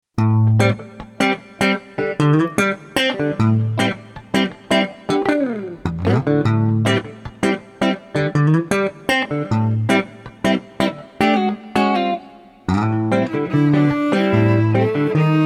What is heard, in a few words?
Jazz